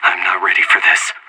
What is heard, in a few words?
scared emote; anarchist